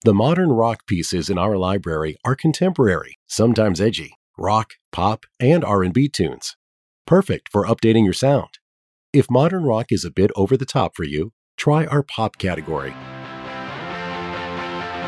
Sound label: Modern Rock, music on hold